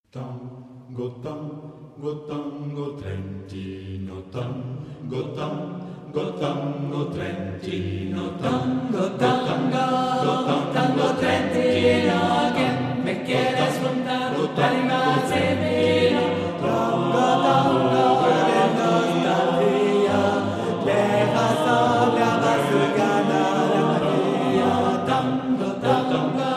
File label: Tango; Trentino